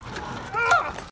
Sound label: Aurgh bucking horse